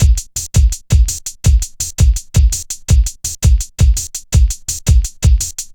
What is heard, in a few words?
Programmed Stepz, Jungle Drum Loops